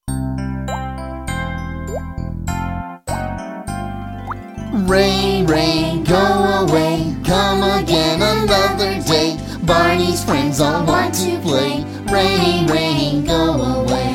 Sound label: Rain Rain Go Away